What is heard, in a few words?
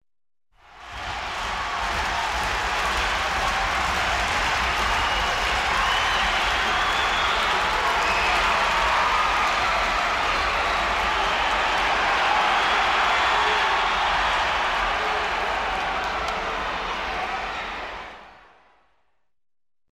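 sound of fans at the stadium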